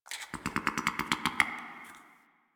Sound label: Drifter Camp Bird; Warframe; Quests; The New War